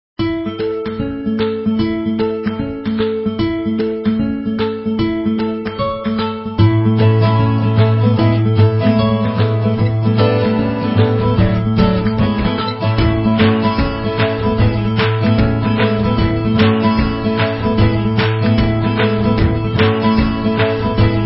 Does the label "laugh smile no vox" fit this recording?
Yes